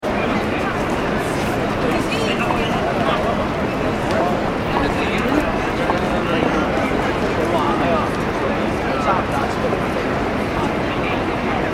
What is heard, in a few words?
ambience urban city street; New York City Street Ambience ( Broad Street ) Medium Busy - People Walking And Talking; Nyc; Construction; McKinney Sound